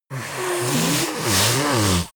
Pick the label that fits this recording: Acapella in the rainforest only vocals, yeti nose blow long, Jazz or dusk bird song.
yeti nose blow long